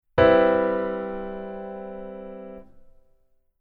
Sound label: keyboard voicing